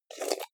jar plastic midsize open fast

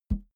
balloon hit head

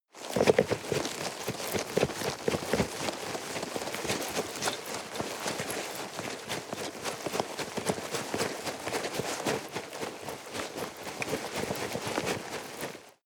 nylon leather run sequence

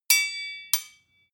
sword ring scrape

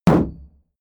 shield sheet impact dent

